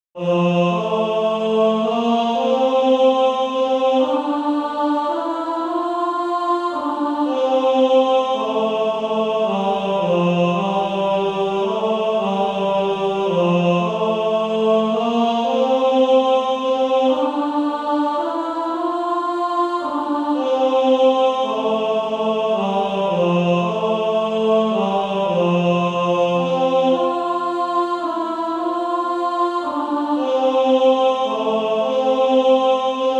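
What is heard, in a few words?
Male Choir, tenor 2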